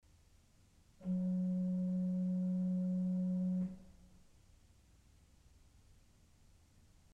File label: Principal 4' FIS, orgel pipes